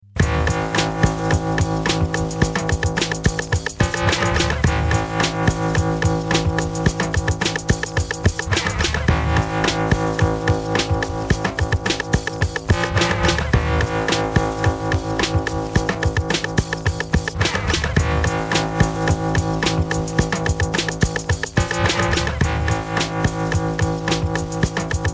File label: beat sketch